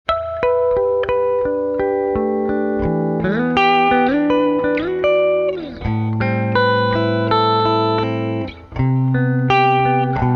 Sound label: Gibson ES-335 Middle Roland JC-120